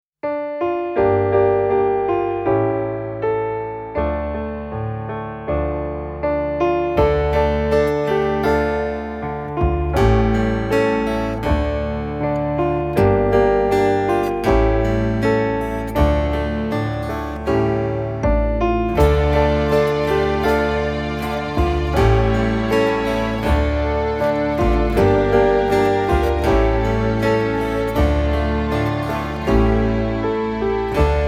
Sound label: Instrumental; Kids Liturgy; Year A